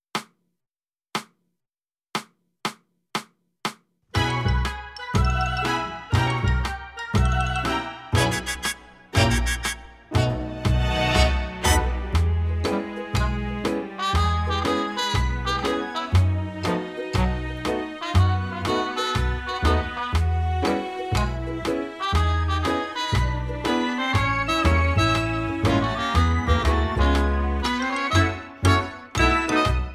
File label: Backing with click